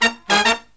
Killer Brass Riffs, SOUL; USB Soundscan vol.29 - Killer Brass Riffs; AKAI

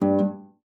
STOP F#2, harp; Xsample VOL-8; HARP+FX

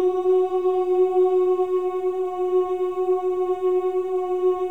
CHOIR OOS, F#4; Spectrasonics; Symphony of Voices

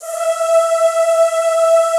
ZZZ E4, Classical Choir; Best Service; Extended Classical Choir

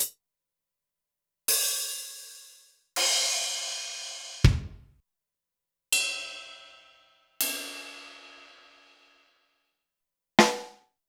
Zoom MRT-3B Kit

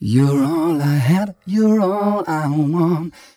m 129 mel e, Vocals

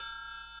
percussion special; Ueberschall; Techno Trance Essentials; DRUMS

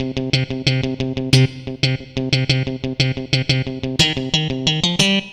Funky Guitar, Guitar Synths

